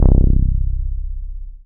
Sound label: BAS MKS-50, Roland